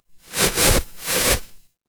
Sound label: paper fabric fabric